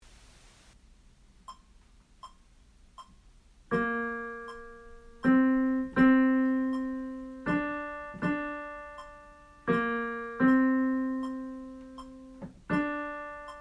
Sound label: Alto